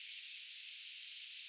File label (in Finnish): tama ilmeisesti on sen linnun aani; tämä on sen ääni, voimakkaampi?